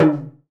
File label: TALK DRUM, AFRO PERC; EdgeSounds; Drum Mashines VOL-1